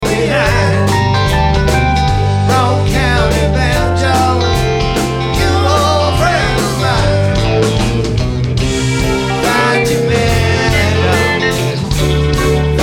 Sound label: Banjo